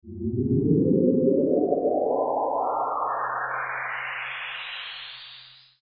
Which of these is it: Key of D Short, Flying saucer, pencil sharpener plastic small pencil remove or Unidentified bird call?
Flying saucer